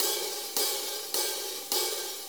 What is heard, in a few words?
Blues HiHats; Sonic Foundry (Sony Creative Software); Loops & Samples Drum Tools